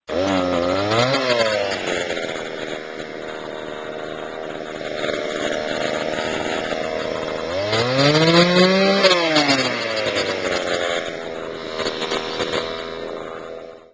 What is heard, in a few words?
Chainsaw; WrongTech; Windows Mobile ringtones; Individual download-only (2009); Microsoft